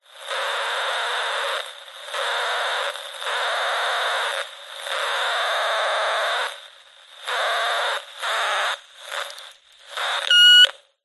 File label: cb radio cheap static and feedback through speaker